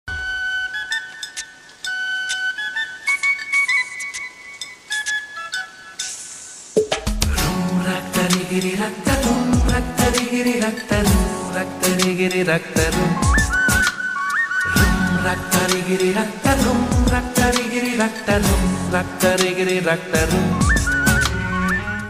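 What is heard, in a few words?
background music ringtone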